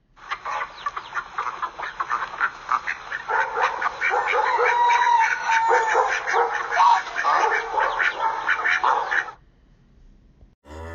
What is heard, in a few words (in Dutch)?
Dierengeluiden; taalpret